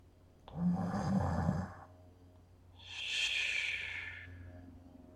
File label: snore; Interactive Jack o lantern